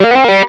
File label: dialog ok; jam; stereo